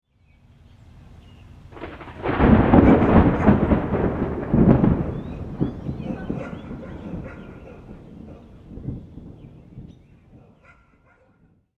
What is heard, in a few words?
Weather Thunder Clap Neighborhood Dog Bark Birds; SFX Bible 6